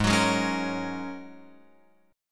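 A flat 7th Suspended 2nd Suspended 4th A flat Guitar Open F, chord; Ab7sus2sus4 strummed; x,3,6,3,4,5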